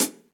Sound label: Hihat Closed Classic Muffle; Drum Hits; Standard Hits; Ableton; Factory Packs; Session Drums Studio